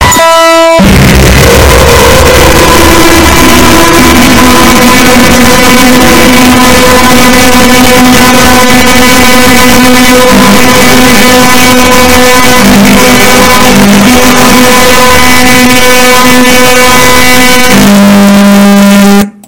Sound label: meow